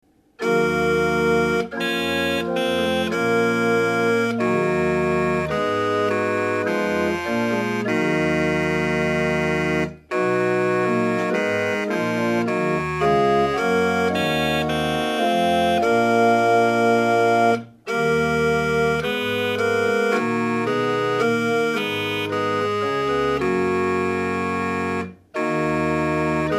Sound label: psalm, huisorgel; psalm 112